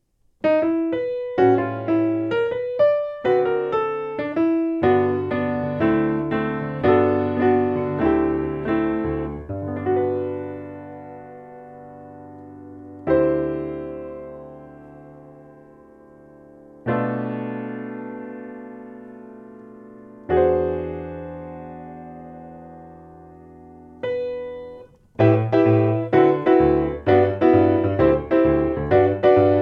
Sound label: Piano